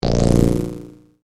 Synth Stab Filter, Production, Element, Synth, Stab, Filter, Short; Stabs; BlastWave